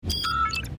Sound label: computer beep